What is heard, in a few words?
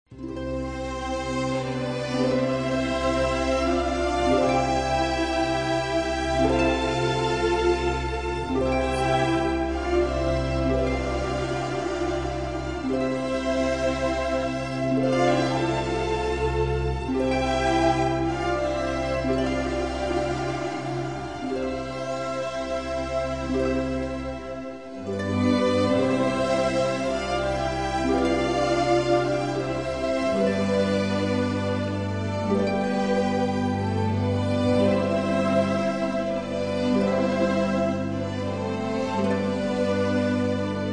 Strings, Christmas